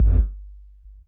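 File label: FX kick 808, right; Masterbits - Soniq Elements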